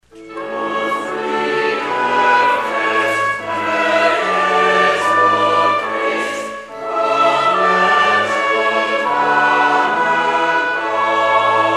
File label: Halt Chorale